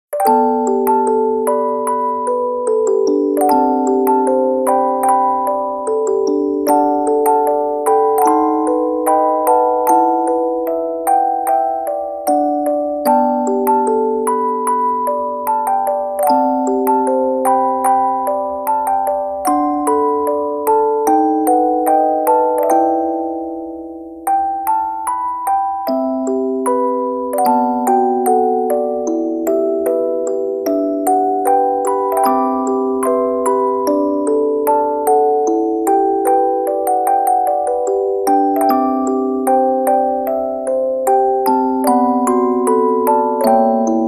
Orgel Ver.; Summer Amulet; Loca Love Original Soundtrack

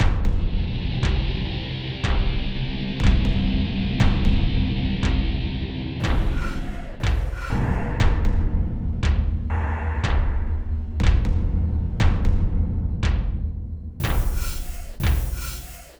Danger Tonal; Insanely Twisted Shadow Planet

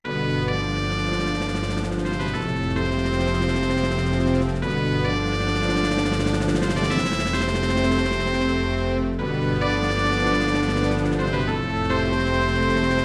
Mission Failed; Star Fox 64; n64

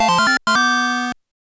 Fanfare - Bug-Catching Contest - Third Place

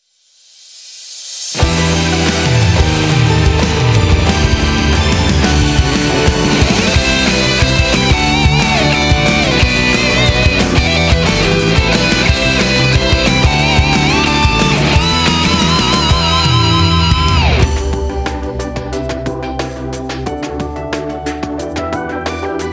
instrumental; Tsuki ni Yorisou Otome no Sahou 2

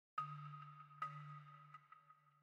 Balafon

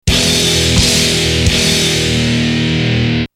finish - lose; ocean city harbor

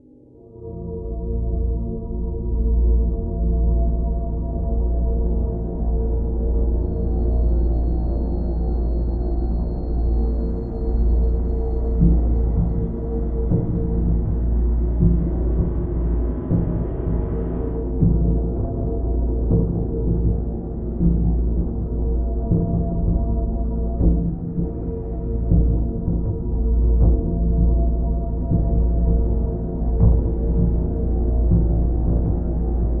Ambient Scene; Tarr Chronicles Sign of Ghosts

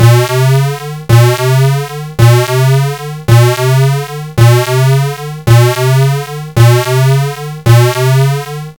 Warning; Blaster Master

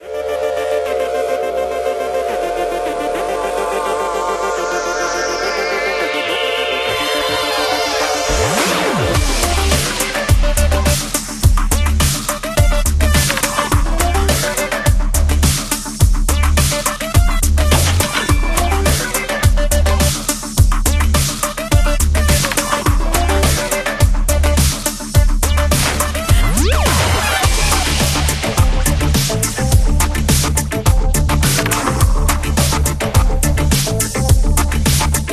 Menu Music Event Super Lottery Basic

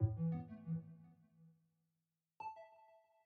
Loading (Jingle)

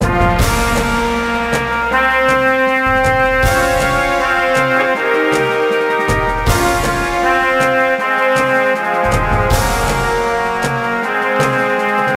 Jingle Mission Win; Age of Empires The Age of Kings; NDS

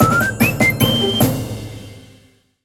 Jingle, Hat Get; streetpass mii plaza; 3ds

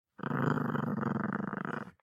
Ghost Growl

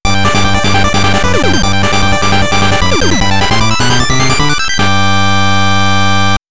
8-bit Jingle; Doki Majo Plus; nds

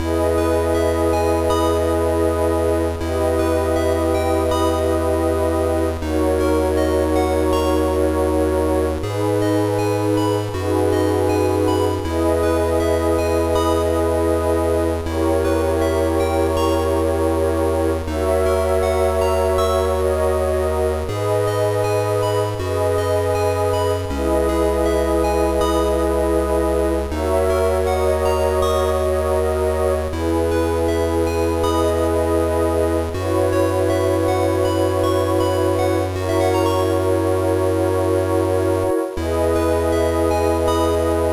Quiet Song; rpg tsukuru advance 2003 gba

